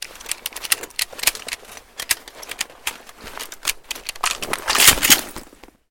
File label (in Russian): marsh brosok pri polnoj amunicii